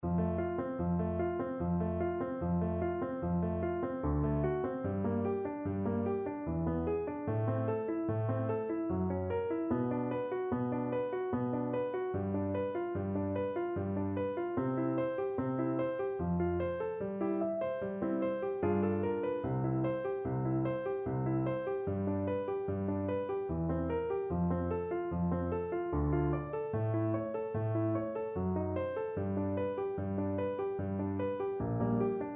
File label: high voice semitones accompaniment; MTB Singing Vaccai